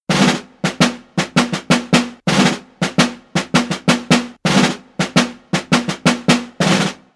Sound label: drum